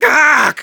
pain severe

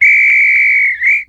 Whistle

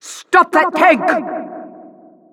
tank alerts

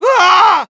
pain severe; Medic

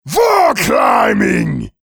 Heavy wall climb

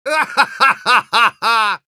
laugh happy

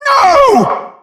headbomb hit

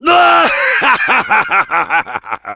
yell; Heavy; fr

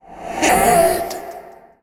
Sword hit

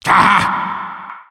magic backfire

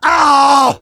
pain severe, ru

